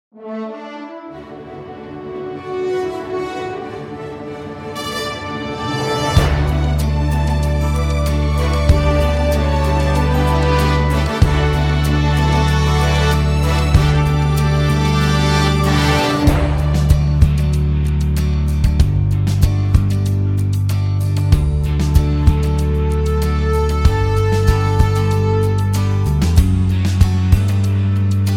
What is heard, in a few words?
Contemporary Track